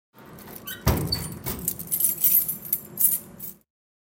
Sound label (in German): Metallschrank u Schluessel